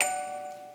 musicbox

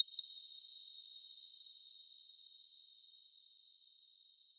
arp

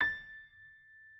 piano

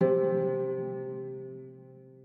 harp

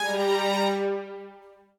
strings

